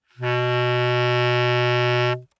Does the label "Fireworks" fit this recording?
No